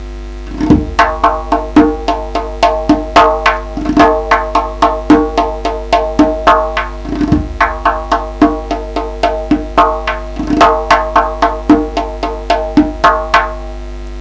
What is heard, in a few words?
12 beat rhythm using pelangs